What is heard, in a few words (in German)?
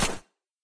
waffen schuss; minecraft